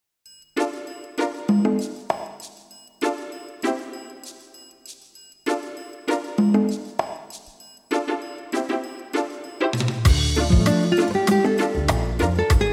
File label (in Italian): ritorn